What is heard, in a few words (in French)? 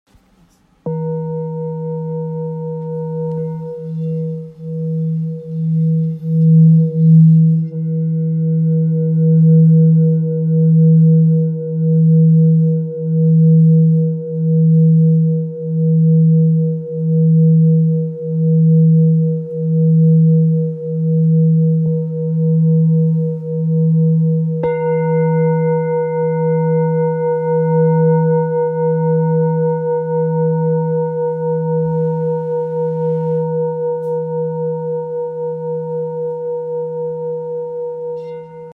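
Bol thick si, bol chantant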